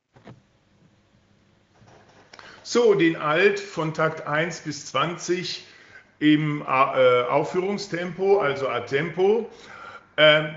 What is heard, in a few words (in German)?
BN Alt a tempo